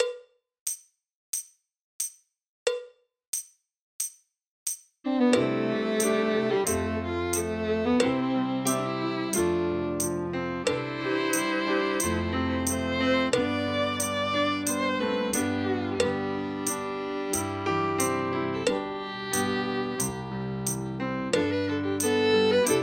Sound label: Drum Kit Playalong; Grade Three